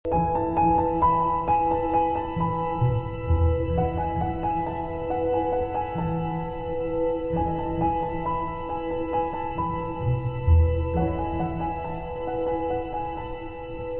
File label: Happyness Piano